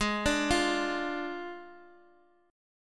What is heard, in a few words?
D Diminished Ab